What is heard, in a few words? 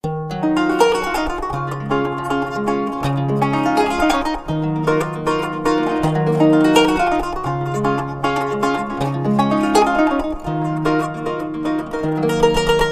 Kanun